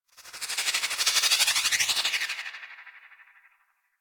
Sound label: Freeze Ray Laser One Off; Warframe; Weapons; Corpus